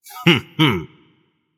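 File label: nonverbal heh heh; smite; voicepack; merlin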